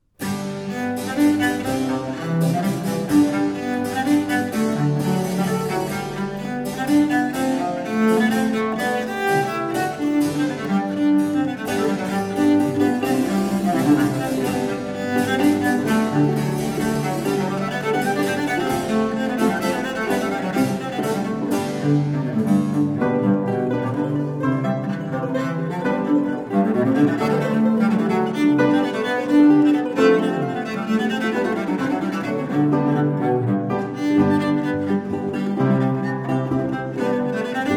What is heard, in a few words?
PassamezzoModerno, baroque improvisation examples; Ross Duffin